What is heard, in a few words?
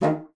bassoon D#3; Xsample VOL-6; C BASSOON